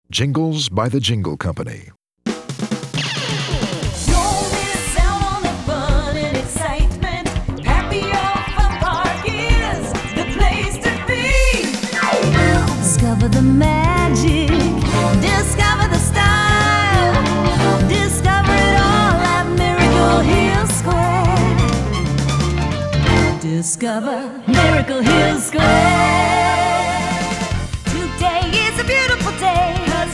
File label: jingle